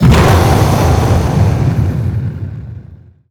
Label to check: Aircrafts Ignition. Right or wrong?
Right